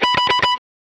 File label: alarm clock elapsed; jam; stereo